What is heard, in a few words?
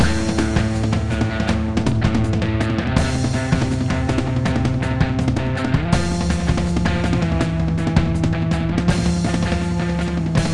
Music Cave; Beach Buggy Blitz; Android